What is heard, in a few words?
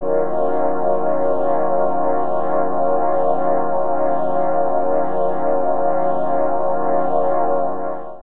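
Pad Synth; Equipped Music; Loungin House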